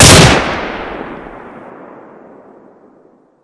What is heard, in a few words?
Fire, gun